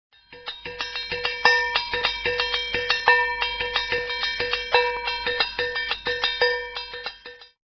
sarten; tradiberia

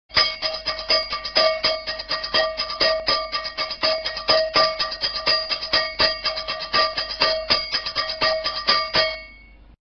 caldero; tradiberia

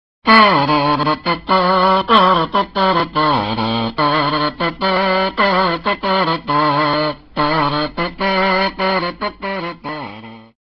mirliton; tradiberia

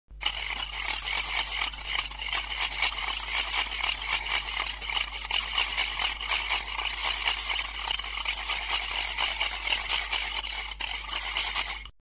carraca; tradiberia

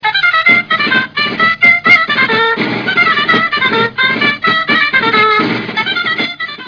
dulzaina; tradiberia

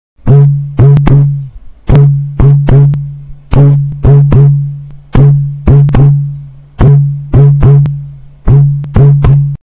cantaro; tradiberia

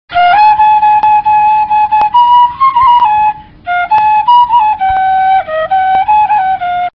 pifano; tradiberia

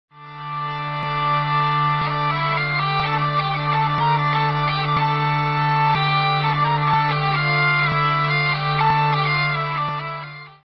zanfona; tradiberia

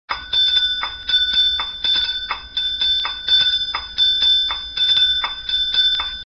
almirez; tradiberia

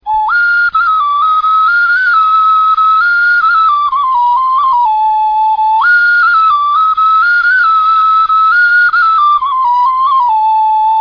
pito puro; tradiberia

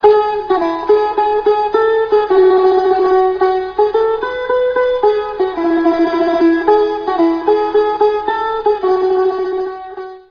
laud; tradiberia

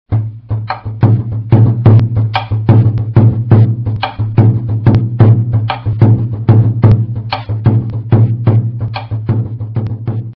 pandero; tradiberia

